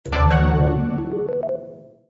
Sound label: win small; Rabcat; Classic 243